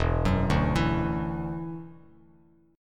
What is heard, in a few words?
E 7th Suspended 2nd, down, Bass Standard